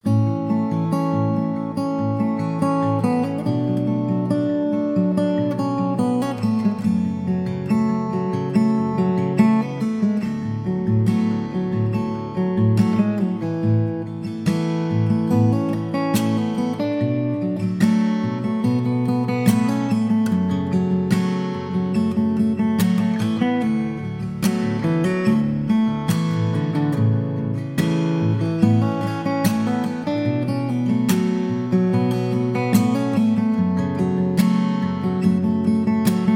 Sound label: Acoustic Instrumental; Pop instrumental